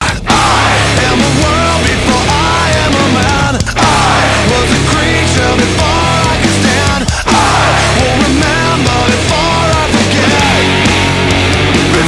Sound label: humans wins; zombie plague